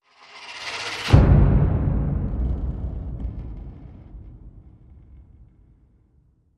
Hit

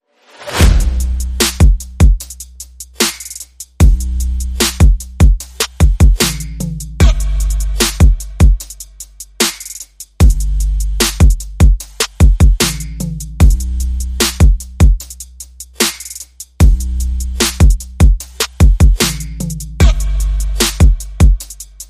Bass and Drum

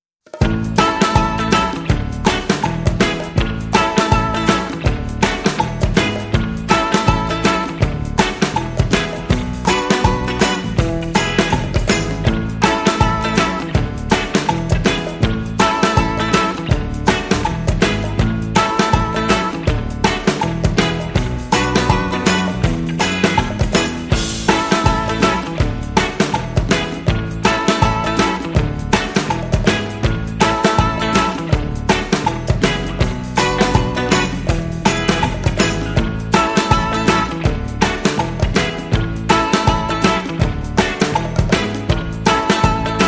Rhythm

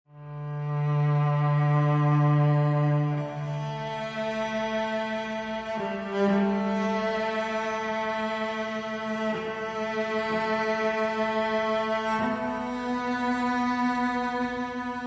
Cello Only

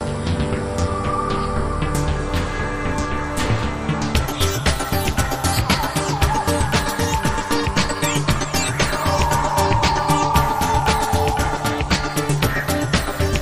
Fastdrive

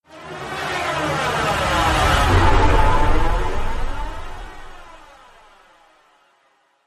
Flangerama

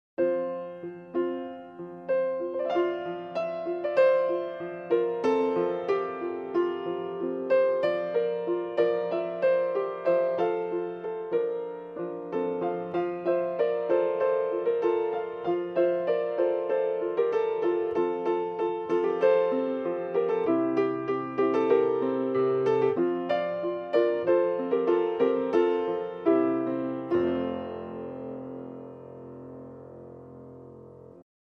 Joy To The World, Solo Piano

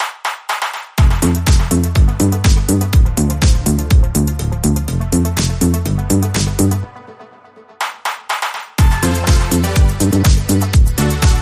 Electro Clubbin

